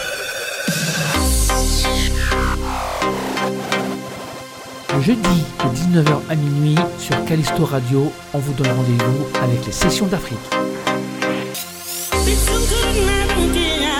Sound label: jingle session d'afrique; afrique callisto; Callisto Radio